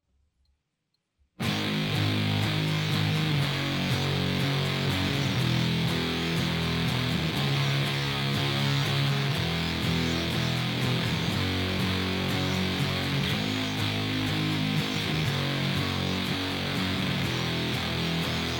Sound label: Guitar